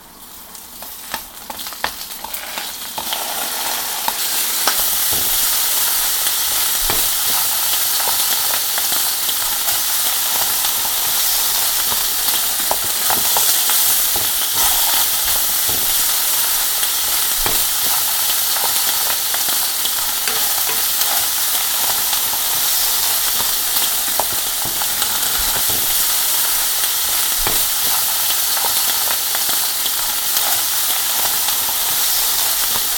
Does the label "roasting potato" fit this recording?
Yes